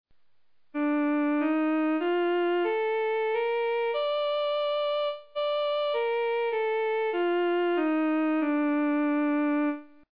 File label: bhUpAlam, carnatic